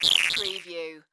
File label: Digital Robotic Transmission